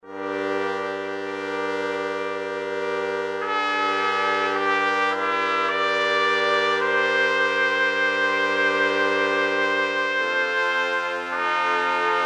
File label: Trumpet Song